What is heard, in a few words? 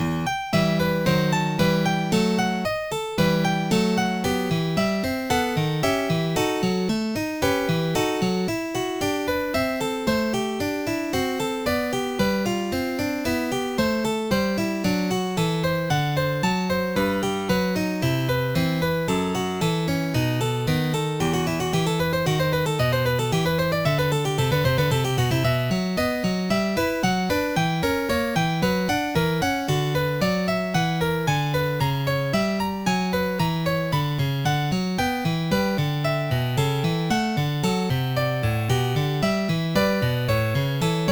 prelude; OctaMED MED